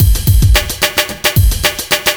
block rock kit

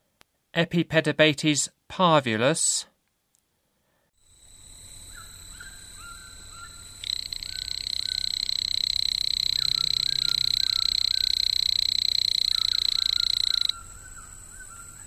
Epipedobates parvulus, Frogs of Ecuadorian Amazon; S. America

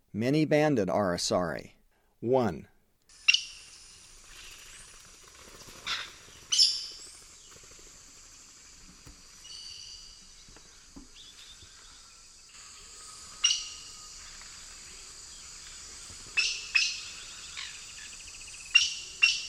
Many-banded Aracari; S. America; Eastern Lowlands

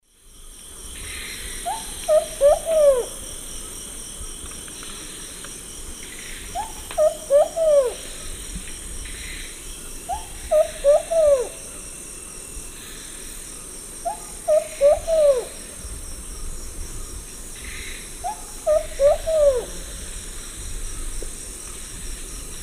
Patagioenas plumbea, song; S. America; Eastern Lowlands

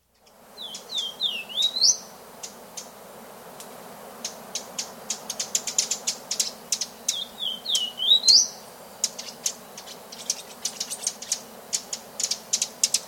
Golden-Crowned Warbler; S. America

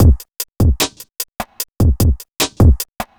hiphop

